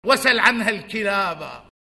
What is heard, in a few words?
loop